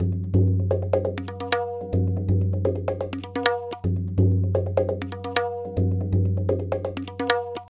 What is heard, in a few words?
Tabla, 125 BPM; Zero-G; Pure Tabla